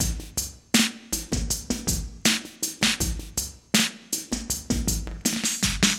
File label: drum; 80 BPM